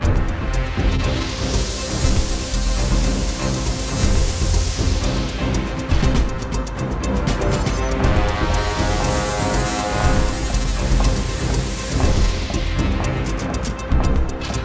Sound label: thriller horror; Jamie Coleman, Film Composer